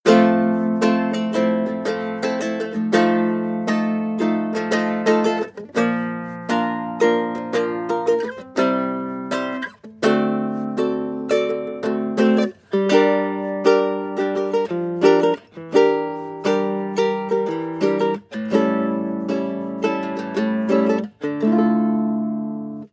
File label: guitarlele strumming sequence; loops